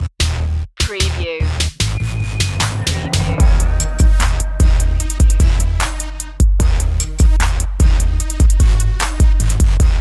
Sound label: bad ass hip hop no vocal